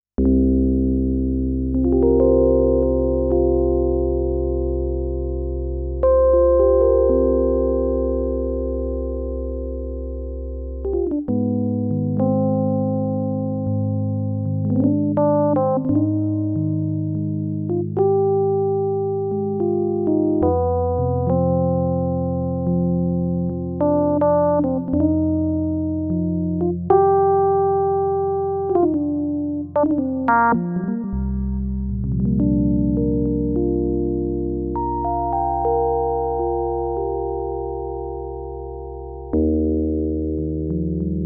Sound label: Reface DX EP; Cuyabeno